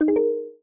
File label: notification chat